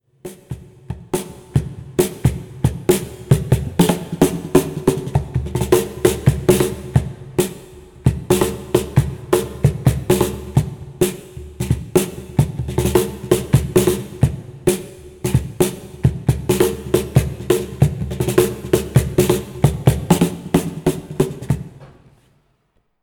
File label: short pro cajon freestyle